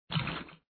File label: squirt build syrup; papa s freezeria gamerip